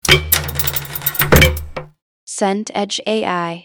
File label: door sliding wood